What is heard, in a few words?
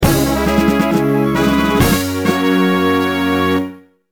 Fanfare Mission Start; Ranger